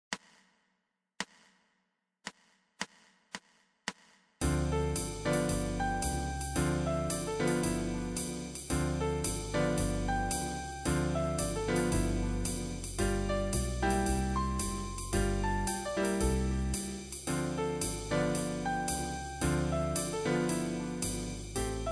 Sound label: Jazz with drums